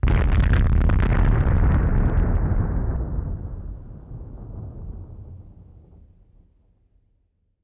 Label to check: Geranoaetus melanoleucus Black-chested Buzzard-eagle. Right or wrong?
Wrong